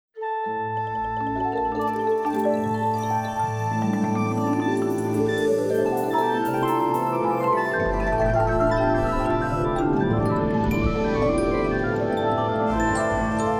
Orchestra Tune-Up; Baby Einstein; Art Time Classics; Baby Van Gogh

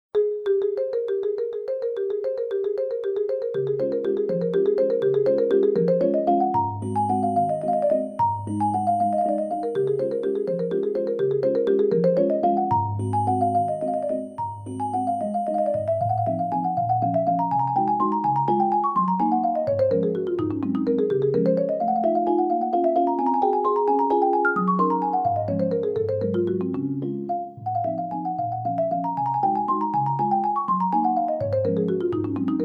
Minute Waltz, Music Box Orchestra; Fredric Chopin; The Baby Einstein Music Box Orchestra; Art Time Classics; Baby Van Gogh

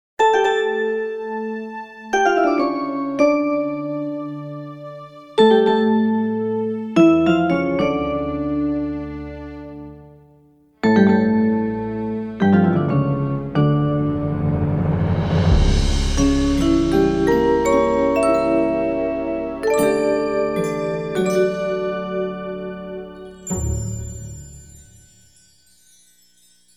Tune Up and Fanfare; Based on a Theme From Toccata in D; Baby Einstein; Baby Bach

